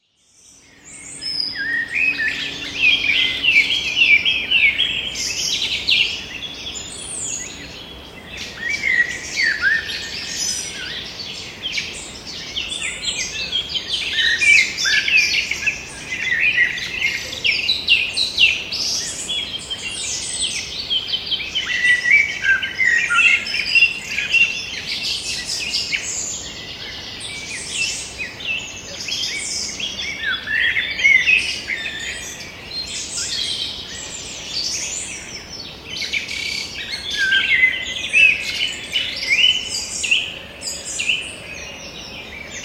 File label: forest ambience many birds